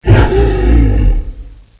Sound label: kp pain